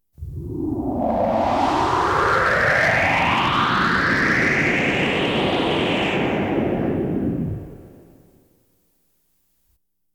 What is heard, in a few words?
Science Fiction effects, Lasers, Light beams